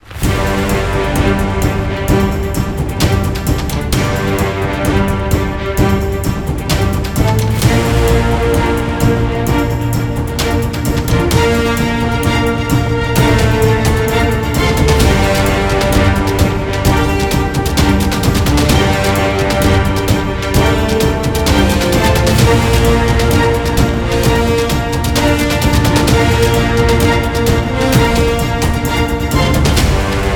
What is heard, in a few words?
Epic Battle; Dual Blade: Battle of the Female Ninja